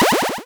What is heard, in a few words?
power up; sky knight